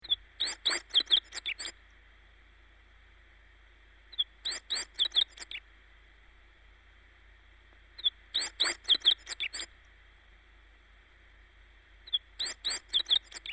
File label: bird